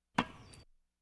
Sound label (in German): tennis aufschlag; Sport